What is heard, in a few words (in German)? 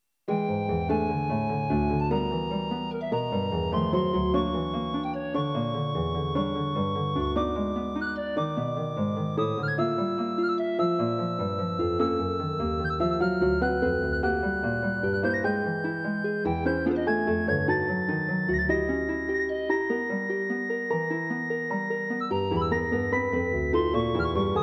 floete klavier; Algorithmische Kompositionen; kramann